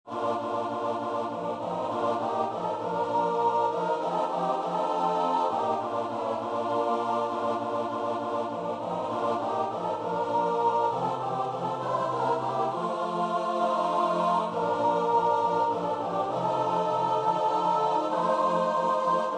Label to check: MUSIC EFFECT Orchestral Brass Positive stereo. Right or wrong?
Wrong